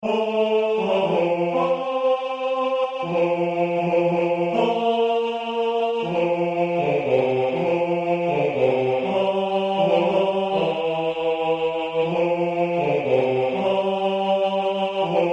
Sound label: bas